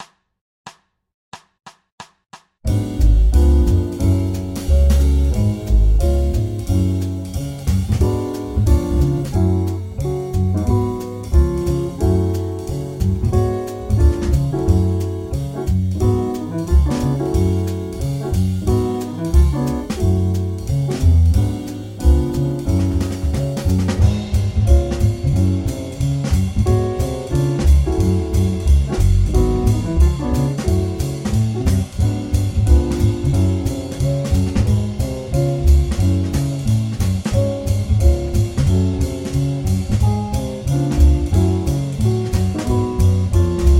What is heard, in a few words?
G#m7b5 C#7 F#m7 (2bars Loop) 180